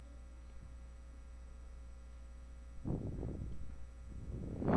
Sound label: erupt